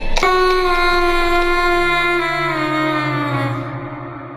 screaming

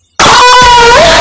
lol sound

